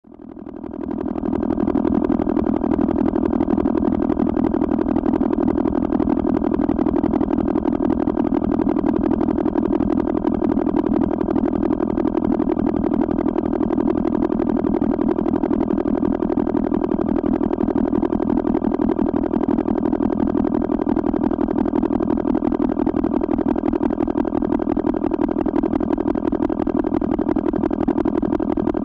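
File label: engine running, cartoon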